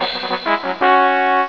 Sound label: Fanfare; PC; MARIOSFUNDAMENTALS